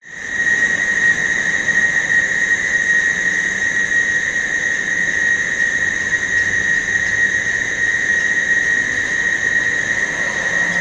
public places village summer evening tropical crickets cicada cars close by; zapsplat